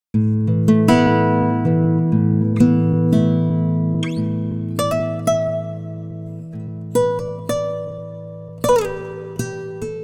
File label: guitar, timestretch